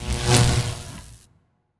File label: Dark Magus Thunder Sparks